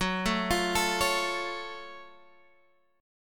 F sharp Minor Major 7th, Guitar Standard; arpeggiated

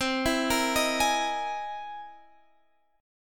C 7th Sharp 5th Sharp 9th C

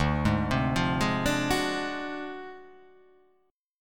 D 7th Sharp 9th Flat 5th D down, chord

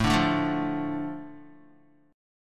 A Suspended 4th Sharp 5th down Guitar; Asus4#5 strummed; JGuitar

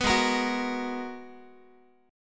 Ab Minor 9th Ab, Guitar; strummed; 14,16,13,16,x,x; down; 4A,4E,5A,5D,5Gsharp,6Csharp

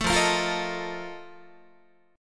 F 11th F, down, Guitar; F11 strummed

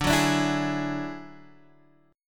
F sharp 7th Suspended 2nd Sharp 5th, D

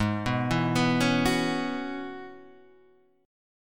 F sharp 7th Suspended 2nd Suspended 4th, G sharp

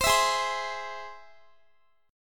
G sharp Augmented, Guitar; strummed; x,x,22,21,20,19

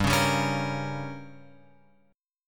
G Diminished; Gdim Chord {3 4 5 6 X 6} strummed

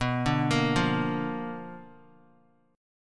B Minor Major 7th Flat 5th Guitar; BmM7b5 arpeggiated; JGuitar

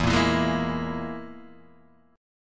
E Major Flat 5th E down Guitar DADGAD

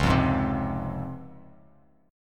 Db Major 7th, Bass Standard; strummed; JGuitar

